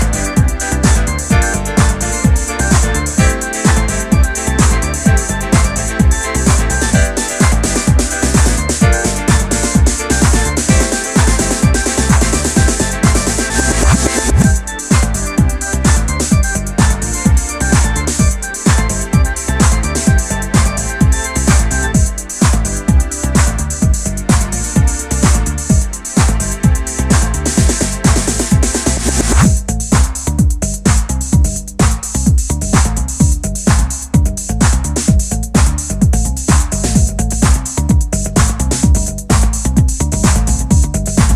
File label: Deep House Mix